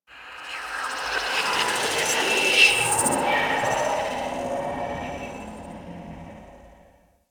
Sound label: Derelict Corrupted Artifact Random; Ambience; Props; Warframe; Orokin Derelict